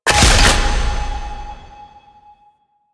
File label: Modifier Pickup; Warframe; Nineteeen Ninety Nine; PvPvE